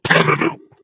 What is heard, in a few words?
ballbot ping